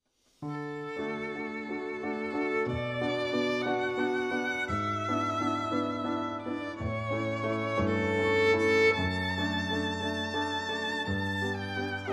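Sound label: violin violin piano cantabile; paganini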